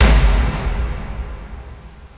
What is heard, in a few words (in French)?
crabe tombe